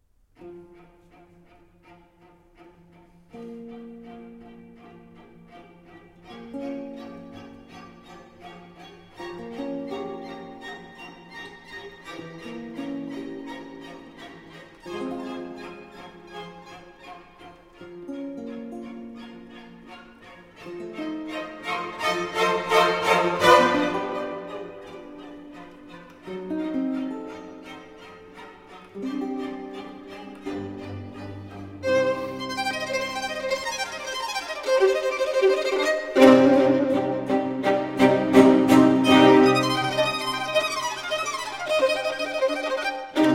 Violin Concerto in F Minor, Winter, Allegro non molto